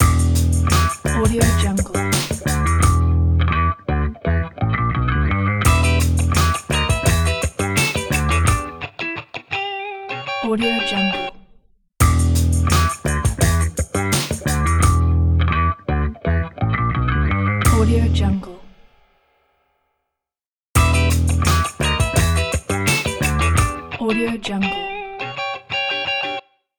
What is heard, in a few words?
seriously funky logo